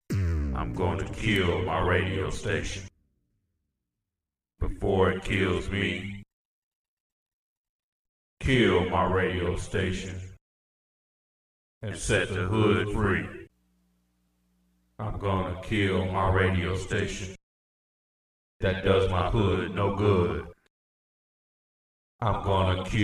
acapella

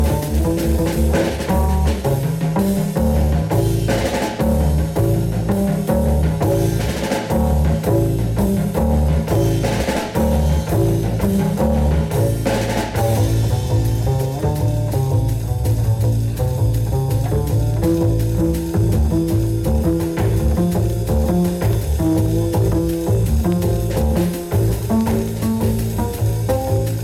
Bass Pedal

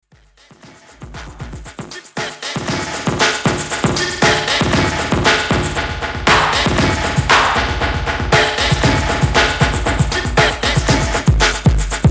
Trance Beats

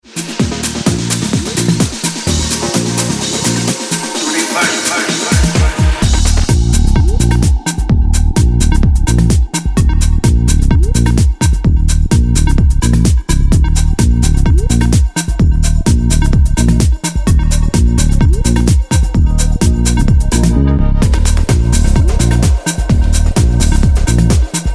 Reverse Cowbell; house

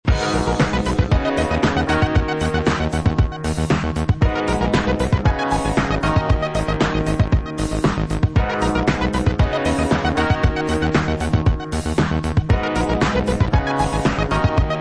Instrumental; Electro

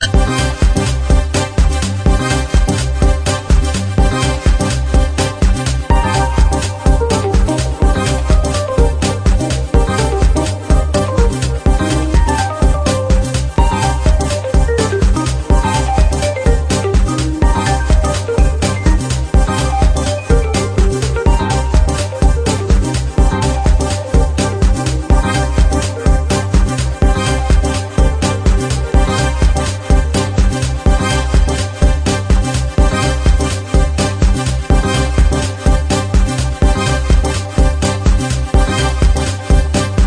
electric piano

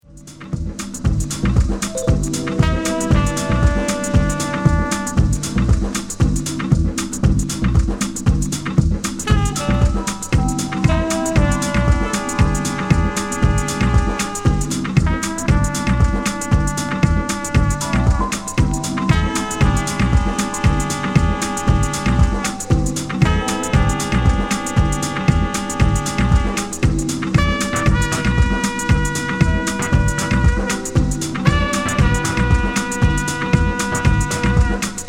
hoots horns mix

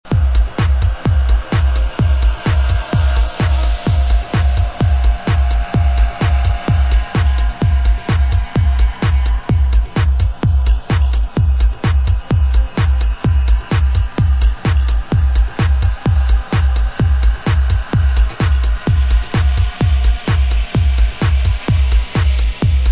Instrumental

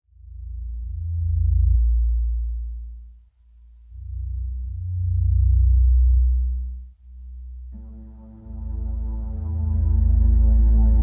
Ghost Perc; Bass

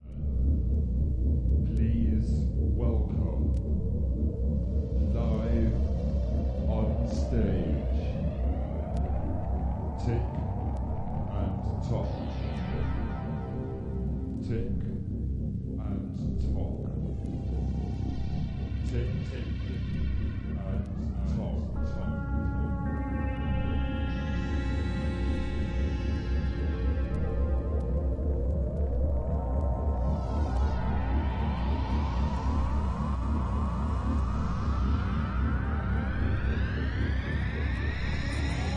Robot Music

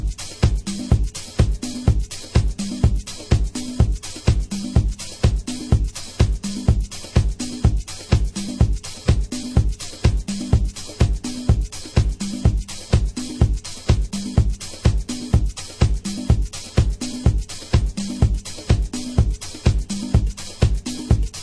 beat

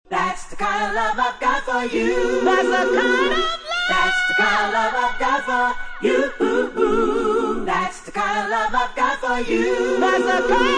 acapella

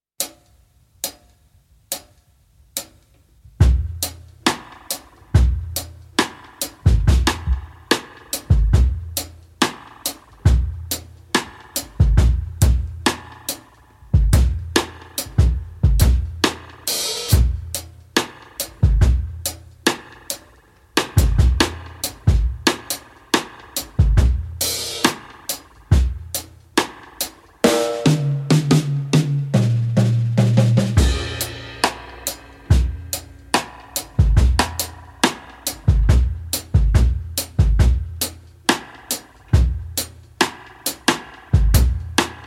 70 BPM UK Break

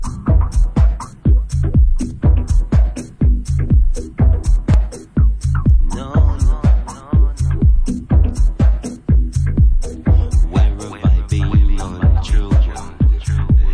club vocal mix; house

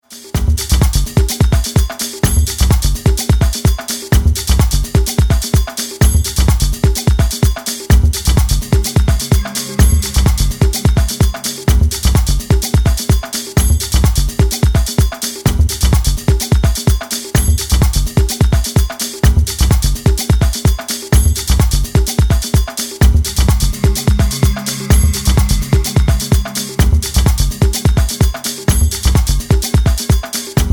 solo beat

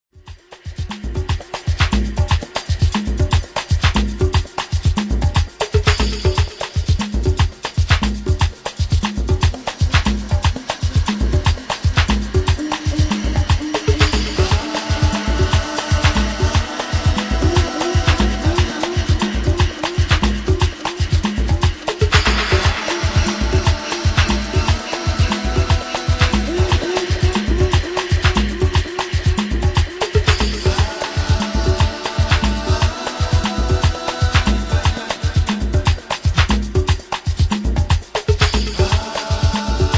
drums

